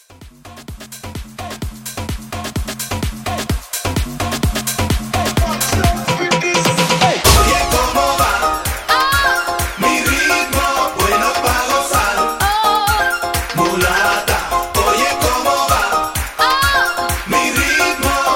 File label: Tribal Mashup 128